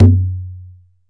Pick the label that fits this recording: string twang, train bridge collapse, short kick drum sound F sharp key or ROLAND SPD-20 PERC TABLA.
ROLAND SPD-20 PERC TABLA